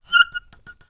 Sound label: wood squeak turn; foley; zapsplat